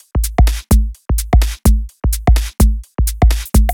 Drumloops 128BPM

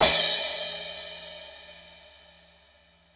cym; house; PS-28 Vocal House Samples; cymbals; Drum & Perc

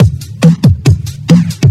Breakbeat; Vengeance Samples; VEC2 Loops

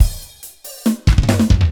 Loops Fills; Vengeance Samples

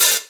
Open Hihats, Cymbals; Vengeance Samples

